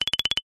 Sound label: cartoon flutter eyelids shake fast